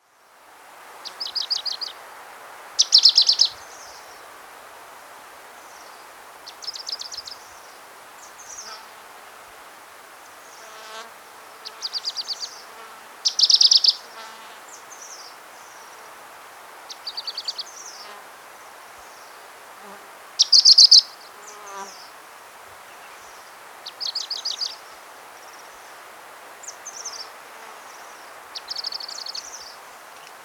Tickells Leaf Warbler Phylloscopus affinis, Ladakh